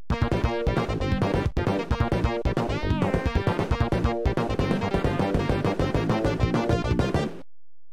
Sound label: Music; Akira Gen